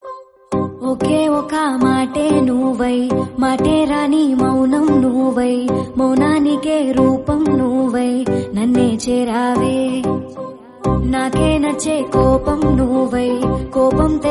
Female Version Telugu

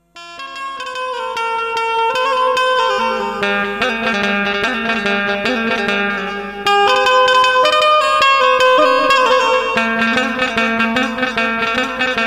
banjo song

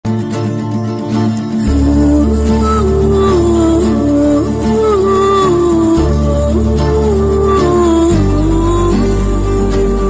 heart touching bgm ringtone love bgm ringtone; genelia

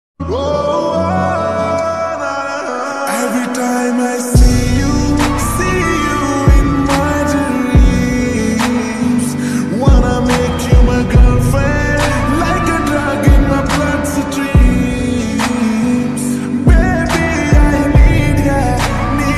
slowed reverb ringtone

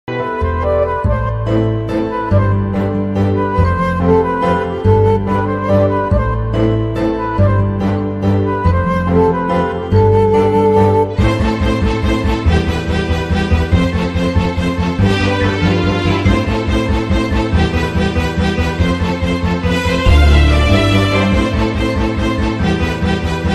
movie bgm ringtone

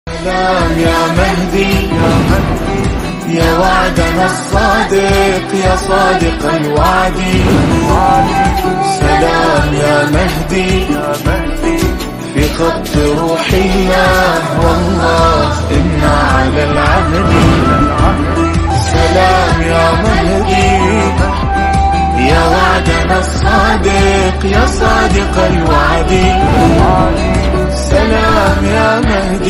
islamic ringtone

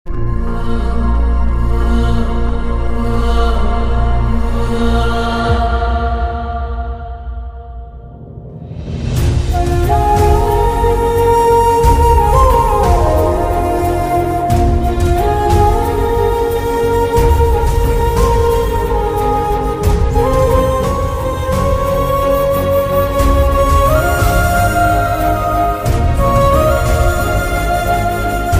emotional bgm; Hi Nanna; Nani

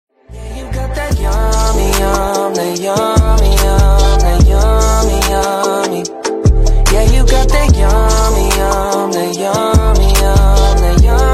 ringtone bass drops

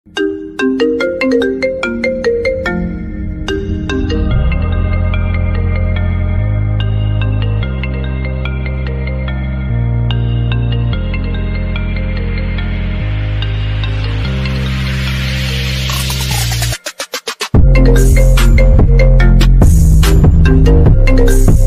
attitude boys ringtone remix bgm party ringtone

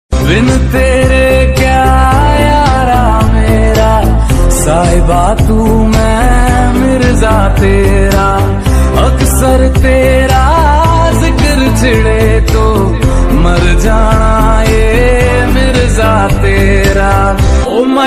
ringtone hindi sad song ringtone

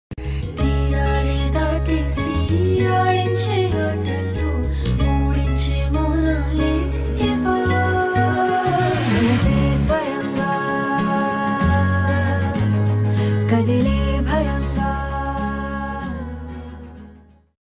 emotional bgm; simran choudhary; prashanth r vihari

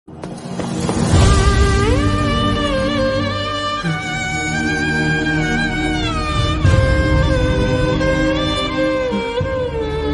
sad emotional music, violin music ringtone; iddarammayilatho

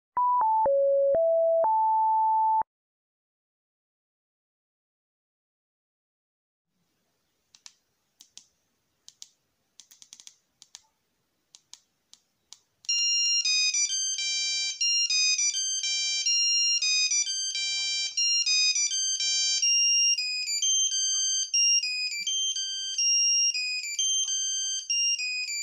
intro bgm played on nokia composer; darbar thalaivar

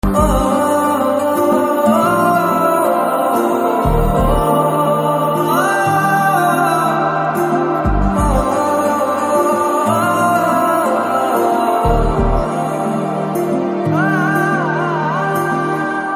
Humming Ringtone; Vakeelsaab

